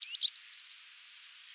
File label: Bird, ambience